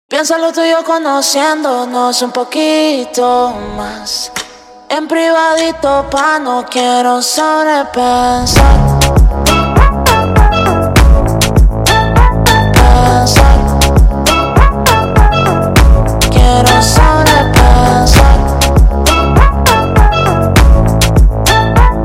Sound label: Phonk MONTAGEM